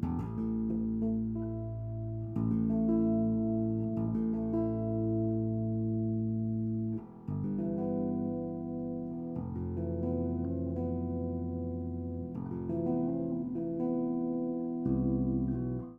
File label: 6-string set